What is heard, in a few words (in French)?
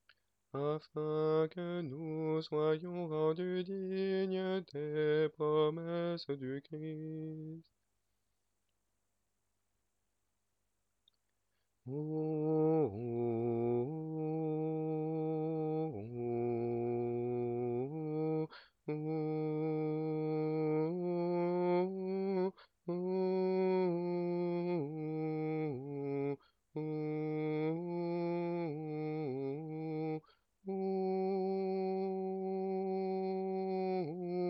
alto; Angelus oraison; mariage